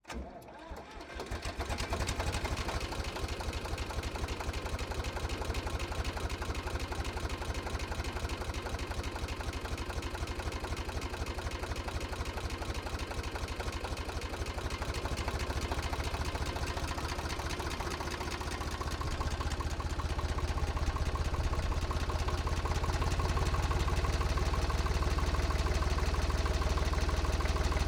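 Vehicle Farm Eicher Tiger II Onboard Driving Sequence Startup Idle Various Constant RPMs Rev Up, Tractor; 1962 EM235; EXHAUST; Dramatic Cat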